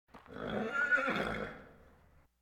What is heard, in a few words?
Animal effects snorts grunts farm ambience